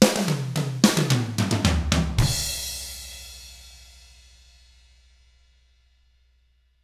POP, Drums; ILIO; Double Platinum Drums 1

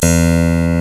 CLAVECIN, HARPSICH

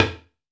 talking drum

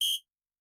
Whist, Yamaha DTXpress-II, Perc

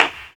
FLAM CLAP; AMG; Global Trance Mission VOL-1

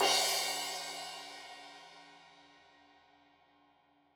Crash 15in L edge, Cymbals Stereo

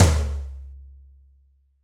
Room Tom 1, Roland BK-5 Toms

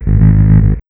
Big Beat Drumloops, 140

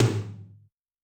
Rock, Tom, Yamaha DTXpress-II

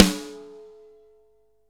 DRY KIT, Drums; Real Mega Drums

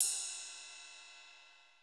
RIDE, SY99; Crypton - DrumBase5000

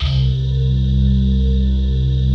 DOO PADS; Pro-Rec - Synthsonic

